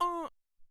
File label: Tk Metal